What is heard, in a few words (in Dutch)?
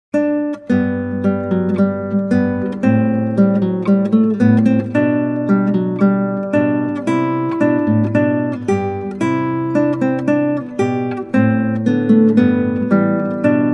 Klassieke Gitaar; Bach 50 Solos